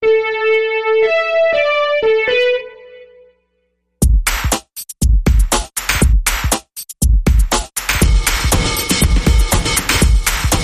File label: Synthetic Dance Bumper